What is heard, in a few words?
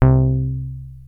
Access Virus B; Synthworx